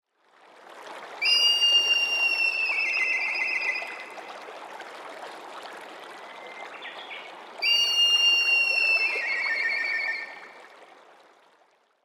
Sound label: tobi fue high